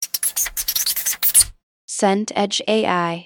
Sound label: whiteboard marker squeak